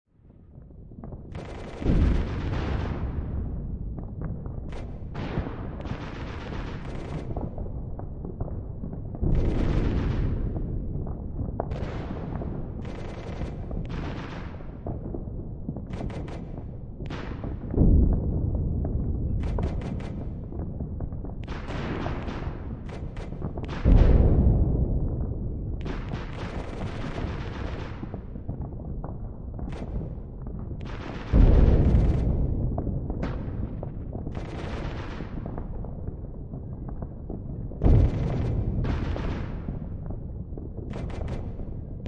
Warfare World War Two Battlefield City Distant Gun Shots; WWII; Gun Shot Sound Effects